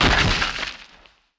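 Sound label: footsteps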